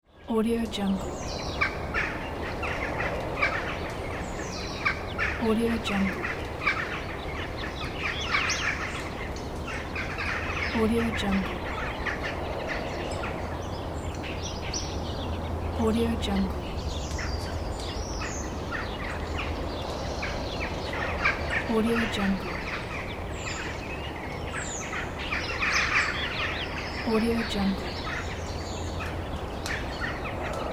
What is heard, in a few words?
park ambience